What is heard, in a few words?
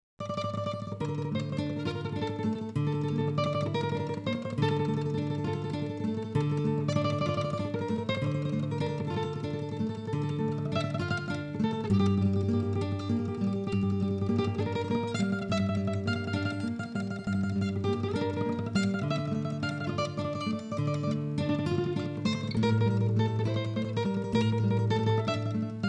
MANDOLIN